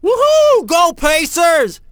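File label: "Pacers", basketball fan; NBA Inside Drive 2004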